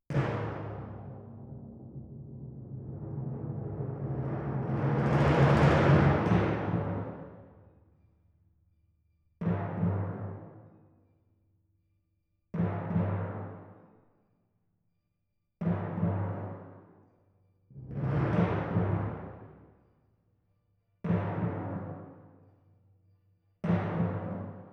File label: Timp